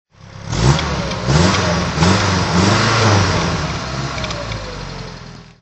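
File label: mb 190sl throttle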